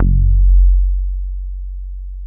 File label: DEEEP F#1, BASSES; Best Service Alpha Dance vol.2